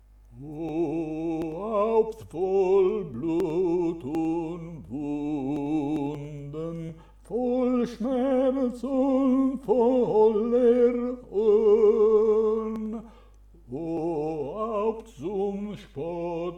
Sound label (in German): O Haupt voll Blut und Wunden - soprani; Passio